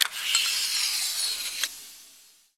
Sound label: toxin open; norden-sounds